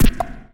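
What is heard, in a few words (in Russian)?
двойной стук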